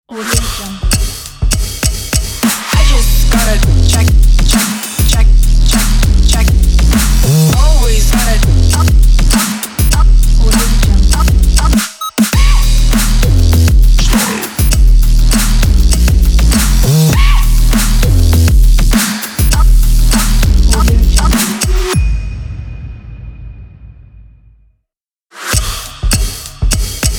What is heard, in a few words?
modern fashion ident